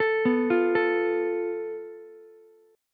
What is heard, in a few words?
A Suspended 2nd Sharp 5th, Ukulele; Asus2#5; arpeggiated; JGuitar